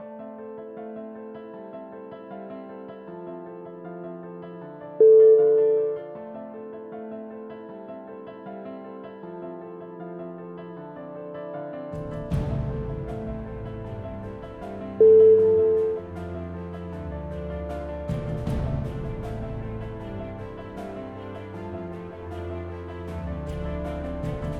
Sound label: dramatic opener